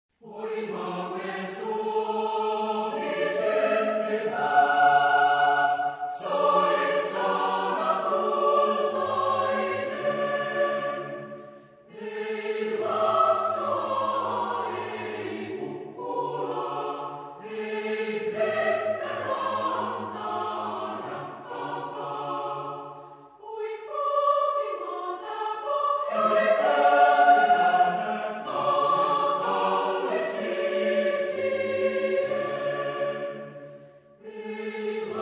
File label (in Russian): gimn finlyandii